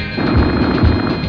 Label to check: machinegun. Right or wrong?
Right